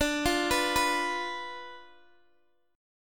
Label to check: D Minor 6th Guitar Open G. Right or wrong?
Right